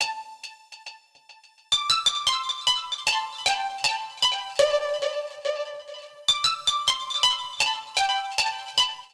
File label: Pipe Delay C Major 105 bpm; Melodic; Tonal; MIDI Clips; Drive and Glow; Ableton; Factory Packs